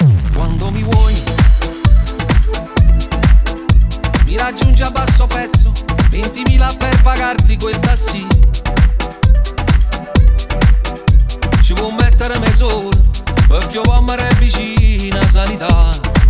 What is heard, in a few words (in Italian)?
remix canzoni napoletane